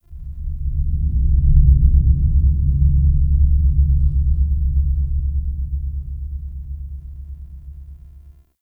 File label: deep rumble LFE; Warframe; Tau